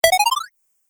Arcade Game Up Sound; Video Game Sound Effects; Retro Inspired Sound Effects - Think Nintendo, Atari, C64

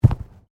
Body movement Fall Medium; Body movement foley to simulate falls and deaths; Wrestling And Struggling Sound Effects

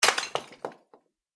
impact rock rubble; Materials; Impact - Rock Thrown / Dropped Onto Rubble; Rocks; McKinneySound

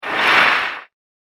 Torture Chamber Rack; Scary Soundscape Sound Effects; horror sound effect

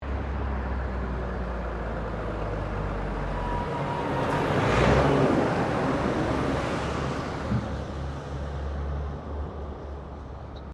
Traffic Semi Trailer Pass By; General traffic sounds; Traffic and Cars

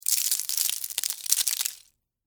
Texture, Debris, Spill, Sugar, Cone, Waffle; BlastWave

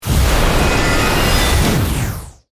Hit Complex Distort

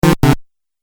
8 Bit Style Wrong; video game; Video Game Sound Effects

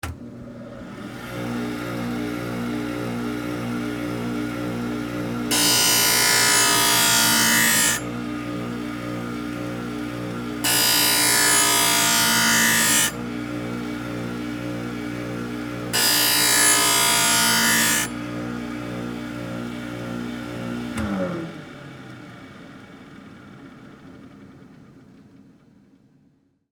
Ice Skate Sharpen, Blade; Sports; Sharpener, On, Run; Ice Skating

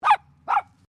Animal Dog Poodle Miniature Bark; Various domestic dogs; Animals

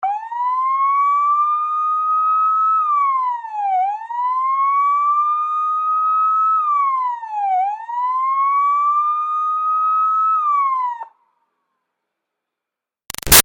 Siren Police Wailer Steady Med Far; Emergency Vehicles; Sound Effects:Vehicles; Big Room Sound

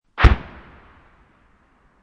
Mortar Distant Impact; Guns; VRSonic

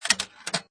Pinball Sound Clanks Slide And Clank; Video Game Sound Effects

